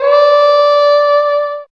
HORN; East West - Phat & Phunky

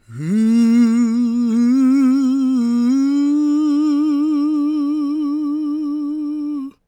GOSP MALE, SOLO

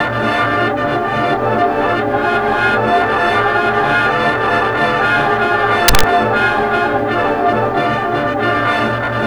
swingin, surreal 40s; Spectrasonic Distorted Reality 1

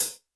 HiHat, Roland R-70

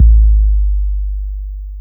BD-FX, Dance Mega Drums; Best Service

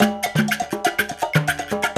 Mozambique, 120, African Contemporary; Spectrasonics; Supreme Beats

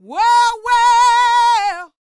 D BIT, Vocal R&B Dance

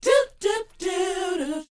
SHOO BE, Vocal R&B Dance; Spectrasonics Vocal Planet

